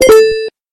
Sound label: Beep Tone